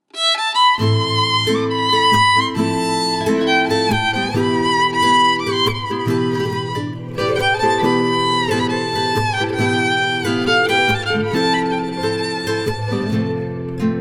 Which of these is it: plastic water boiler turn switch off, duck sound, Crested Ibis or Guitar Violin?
Guitar Violin